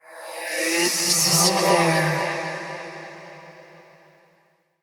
Hand Compass Vocal; Warframe; Duviri

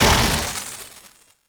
Focus Loop End; Warframe; Madurai

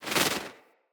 Duck Tail Shake; Foley

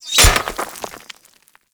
Kunai Impact Dirt; Warframe; Hit Effects; weapons

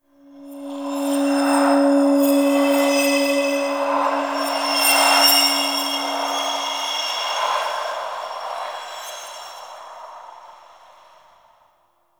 Interior Cave Spirit Gust; Ambience; Duviri

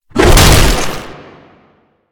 Infested Ancient Small Arm Swing; Warframe

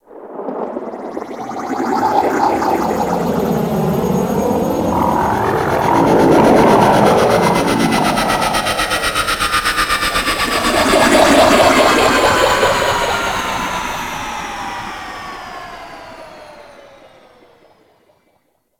Liset Hub Docking B; Warframe; Ambience

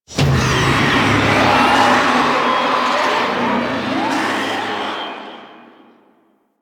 Zoo Wave Pain Stinger; Warframe; NineteenNinetyNine; Stage10TheZoo

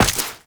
Step Ice, Footsteps, Walk; Warframe; Characters; Tenno

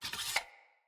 Player Short Sword Unequip; Weapons; Swords

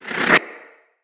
Player Resurrect End; Tenno

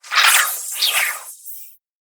Gas City Wire Whip; Warframe; Corpus Gas City; Ambience

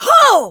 Punch, Barks; Kuva Lich; Persona B; Ability

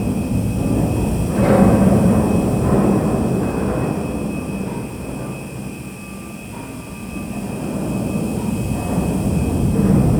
Loading Tube Amb Loop; Warframe; The New War; Zariman; Interior

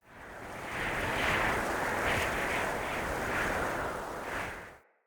Mountain Wind Gust Whistle Soft

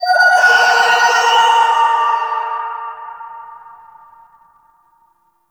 Mine Drone Underwater Alert Vocal; Warframe; Grineer

